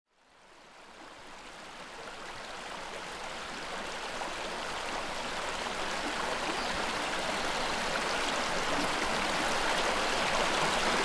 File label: Nature